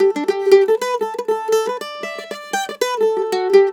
MANDOL 120 G; USB Soundscan vol.42; Celtic Flavours; AKAI

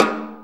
Snare B; Sonic Foundry (Sony Creative Software); Loops & Samples Drum Tools

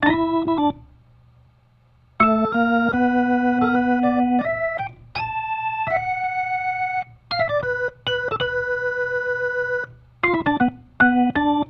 Soul Organ Riff, 70s Analog Funk

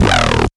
D Kindalectro HP, bass

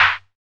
SAND, Drums; Distored Reality 1&2

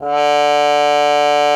cup mute D3; Xsample VOL-3

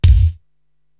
BD Gated, Korg TR-Rack

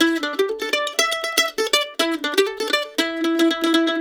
LICK, Blues Guitar; ILIO; Fretworks - Blues Guitar Samples; 120 CEE LICK

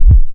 Reversal Kick; Cwejman S1; DevSnd Cwejman S1 - JK

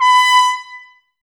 BRS, Woodwind Brass Ensemble; Miroslav Vitous

